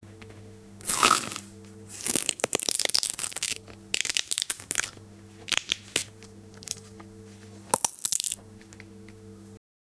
sound effect eat, eating

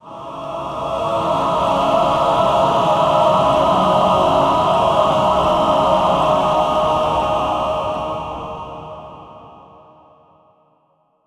screaming choir with roar

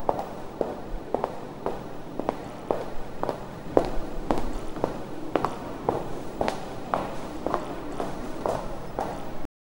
heeled shoes on a train, hurried

